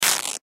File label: Cloth Rip Tear Pants Fabric; Ripping; Objects; Blastwave; Foley